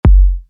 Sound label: Kick